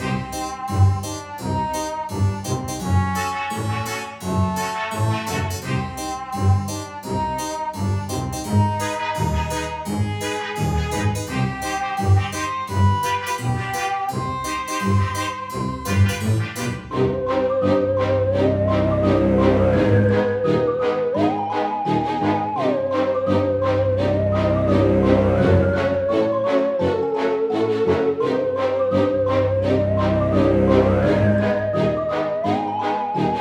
Combat Alt Beginning Loop; Ghostbusters

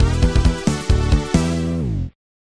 Level Complete; Archibald's Adventures; PSP

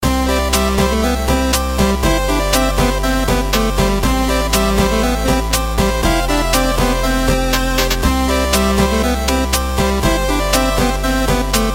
music minigame; Dash Quest; android

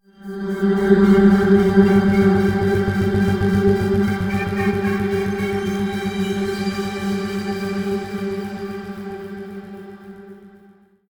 Music Loop

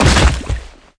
Footsteps; SFX Character

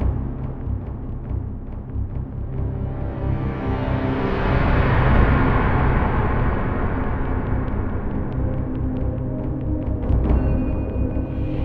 Zeppelin Ambient Light Loop; The Golden Compass

